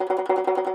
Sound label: T. BANJO, Tenor Banjo, Plucked Strings; E-MU Producer Series Vol. 7 – Old World Instruments